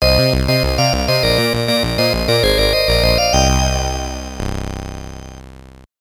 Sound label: stage clear; Chiptune; Turbo-Grafx 16; Castlevania - Rondo of Blood